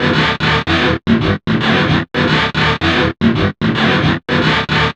GTR, Chemical Beats

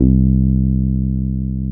TB 303

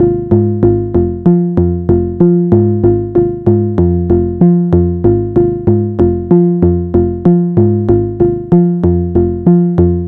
SynthBass, Synth Bass Loops; Sonic Foundry (Sony Creative Software); Groove Elements

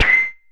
Perc Hit, Noise

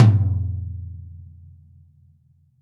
DW Red Maple Tom Room; Sonic Foundry (Sony Creative Software); Vital Drums

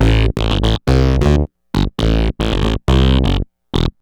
Synthbass Funk, Synth Bass Loops; Sonic Foundry (Sony Creative Software); Groove Elements

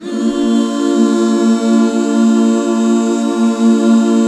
BB MAJ, Back Chords; Sound & Vision - Gigapack II CD 1

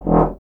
Tuba; Miroslav Vitous - Solo Instruments VOL-2

